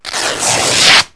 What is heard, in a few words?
long rip, rip tear; Wired for Sound Pro CD; Aristosoft, Inc.